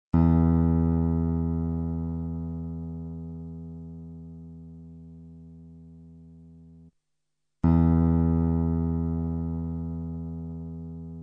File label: Tuning note low E; 10 Rock & Blues Solos; De Haske